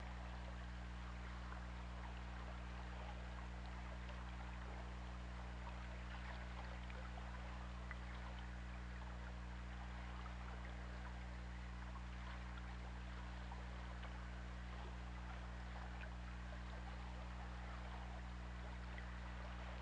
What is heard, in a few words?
Orca; OrcaLab